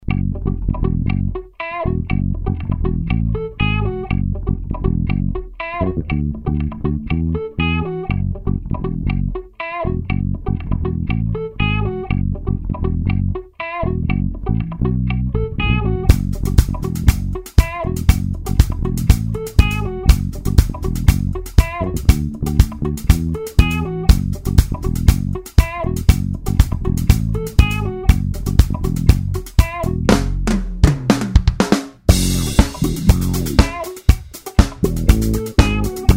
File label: Bass Groove funky fretless